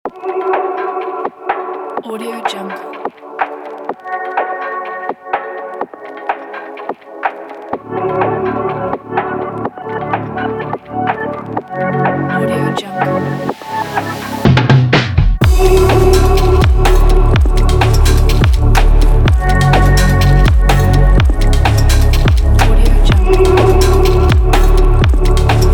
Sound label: organic abstract beats